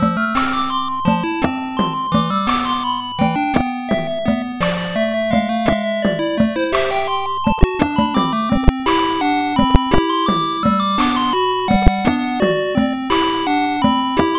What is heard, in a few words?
Picture Book BGM